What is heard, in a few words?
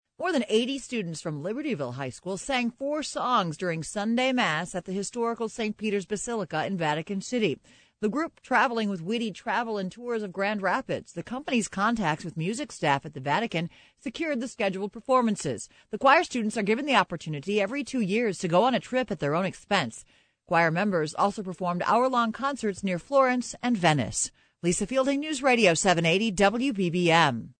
choir